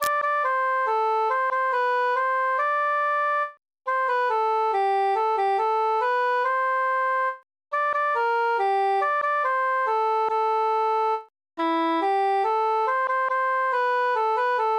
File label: cantus solo; pucelete